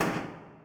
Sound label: heli fire single; Helicopter